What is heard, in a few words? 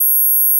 Gauss Filt G7